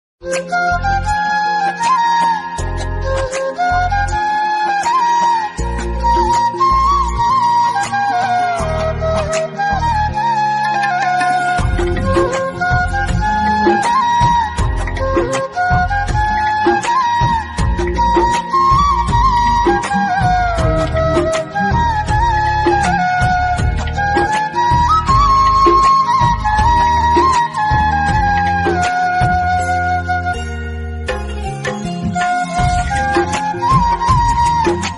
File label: flute ringtone instrumental ringtone